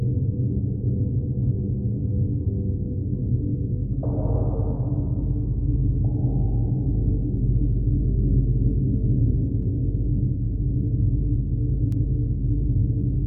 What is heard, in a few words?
tunnel